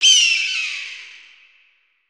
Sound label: Hawk; gnarled forest